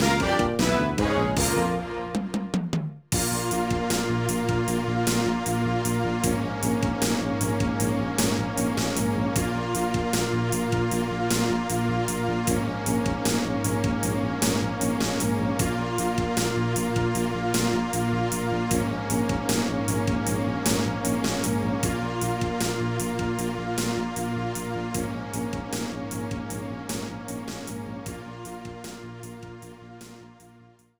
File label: Lose Loop